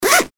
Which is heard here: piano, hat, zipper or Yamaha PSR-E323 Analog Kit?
zipper